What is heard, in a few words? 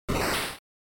Noise Phrase, NES; NES-General